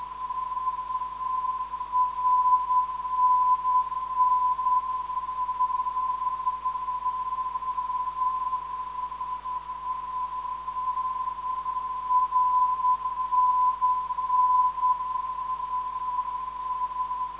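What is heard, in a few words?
rnn 358khz, non-directional beacon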